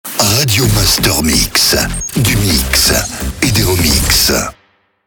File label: Jingle SLOW; Radio Master MIX; Habillage